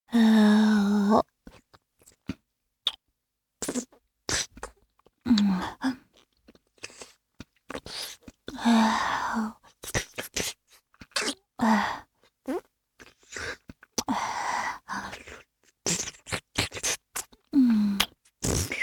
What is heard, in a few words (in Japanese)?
フェラチオ(弱):半勃起の亀頭を舐める, チュパ音; チュパ音抜粋集